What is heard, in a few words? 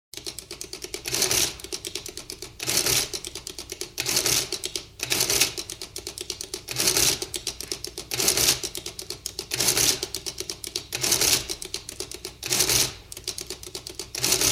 Manual adding machine; SOUND EFFECTS1